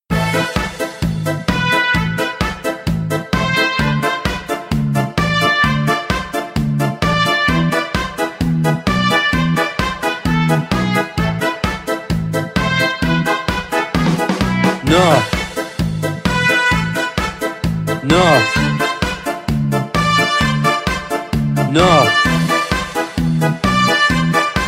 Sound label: furry song